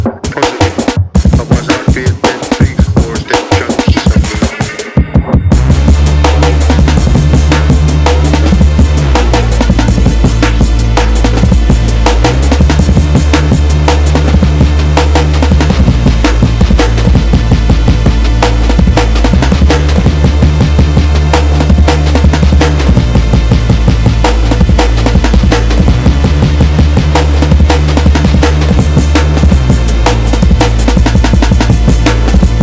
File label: Jungle; Virus Voice